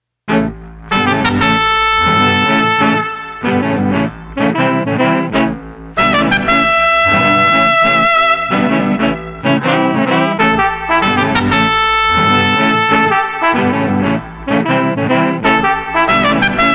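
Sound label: Street Brass Woodwinds Funky no Drums, 95 bpm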